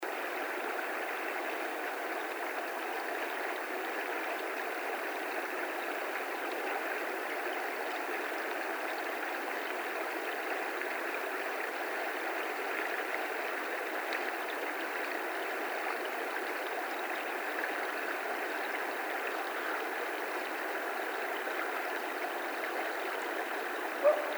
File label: Coyotes; Coyotes (Canis latrans); night sounds